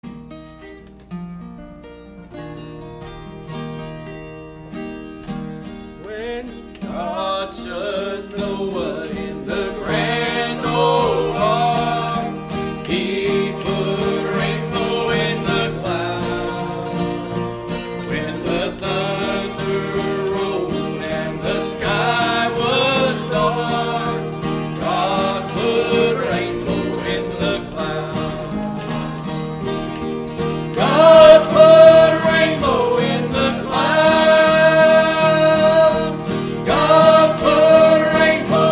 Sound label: Choir; God Put A Rainbow In The Cloud